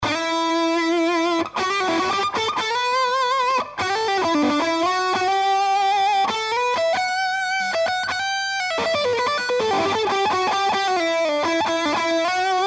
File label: solo room no delay